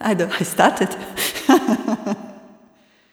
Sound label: laugh; Sonic Foundry (Sony Creative Software)